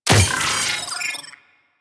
Drone Death Malfunction

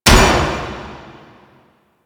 Fist Impact Metal; Enemies; DE; Warframe; Tyl Regor; Fists

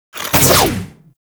Ninja Stab Attack Action, Foley; Tau Dax

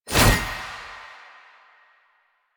Gunbrella Aim Transition Out, Weapons; Warframe; Tenno; Koumei

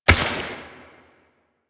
Step Water, Footsteps

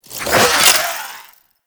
Necramech Repair Drone Right; Enemies; Warframe

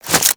Equip, Weapons; Warframe; Furax

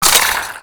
Mech, Weapons Cannon; Kuva Zarr

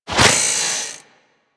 Worker Grinder Whoosh, weapons; Grn Worker Grinder

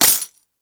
Fist Claw Coin Hit; Warframe; Tenno; Incarnon

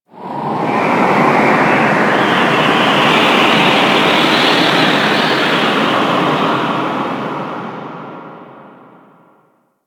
Moon Space Wind, Ambience; Warframe; Orokin

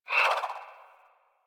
Swing, Melee; Balance One; Warframe; Weapons; Modular Melee

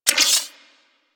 Multi Gun Overcharged Fire; Enemies; Abilities; Arachnoid Wraith; Warframe; Corpus

